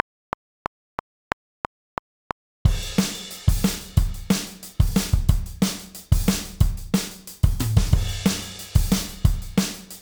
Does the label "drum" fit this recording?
Yes